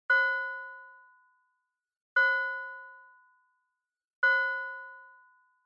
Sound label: Fire Truck Siren Fake Bell